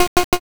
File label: picoeat